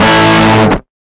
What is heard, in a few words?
guitar stab